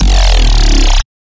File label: Bass Shot X Groan, Dubstep; Ghosthack; Advent Calendar 2018